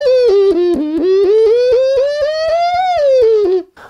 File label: Auto tune